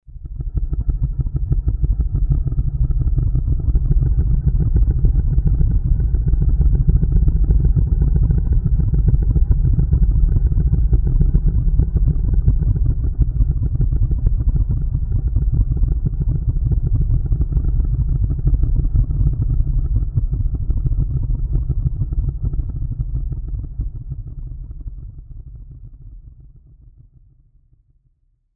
pulsing deep layer

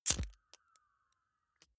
lighter wheel switch on flame gas

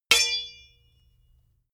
sword hit sweetener

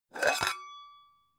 pan open hard

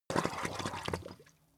swamp oneshot bubble

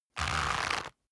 leather creak short medium

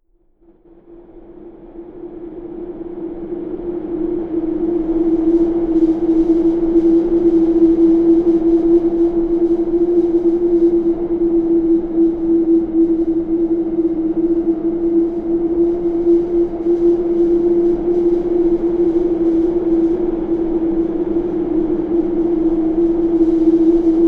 wind swept

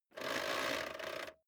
vacuum cable pull in slow

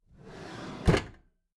packed drawer smooth clatter open regular

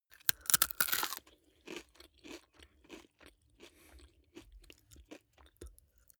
eat potato chip mouth closed hesitant

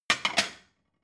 plate shelve slide hard

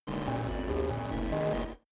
space computing machinery

